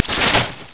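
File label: laser beam; aggress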